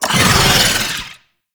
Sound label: Boss Vocal Pain; Enemies; Vocals; Warframe; Oraxia